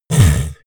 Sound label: monster snuffle big